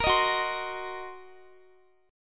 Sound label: Gb 6th Flat 5th Gb down Guitar; Listen to Gb6b5 strummed